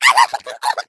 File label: hot laser; Portal 2; Source Engine; video games; eggbot